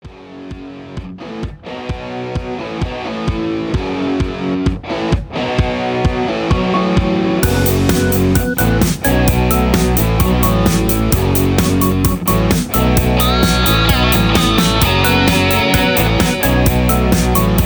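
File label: Leads No background vocals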